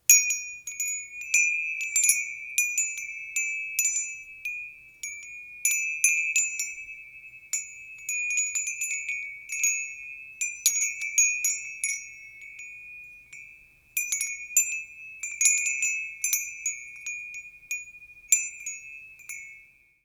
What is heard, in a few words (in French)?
carillon moyen; bellissimo